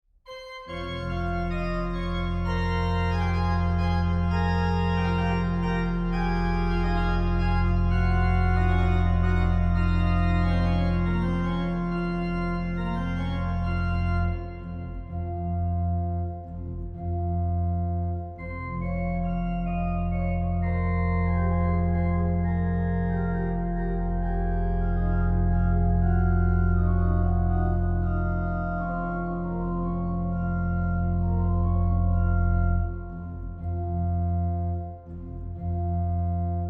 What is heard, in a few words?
gavotte f minor, organist